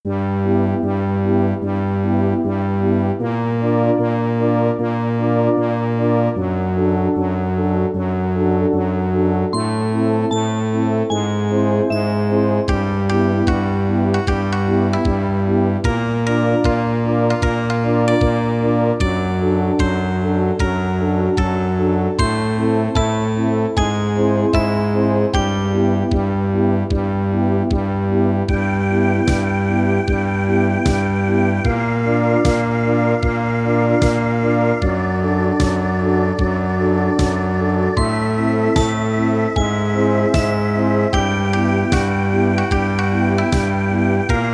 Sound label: death theme; PAPERS, PLEASE